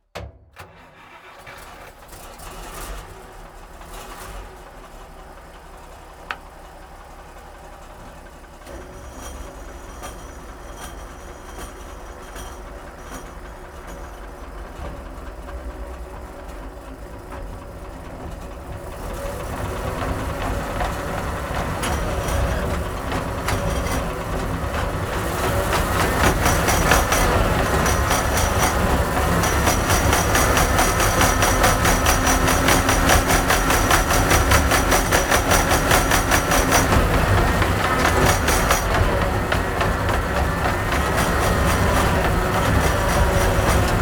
SU76, Onbrd, Start, Medium, Drive, Stone Tiles, Various, Stop, Off, Engine, Gearbox; Back; DPA40